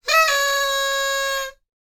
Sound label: whistle party noise maker; foley; sound effects; sound effects one